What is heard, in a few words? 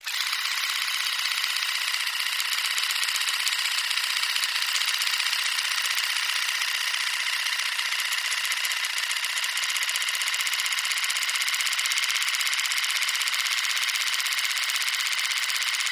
Motor, Small; Electric Toothbrush, Fast With Variances, Loop